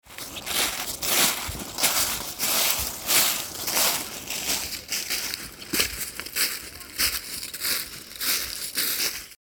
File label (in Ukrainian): shurhotinnya osinnogo lystya v botanichnomu sadu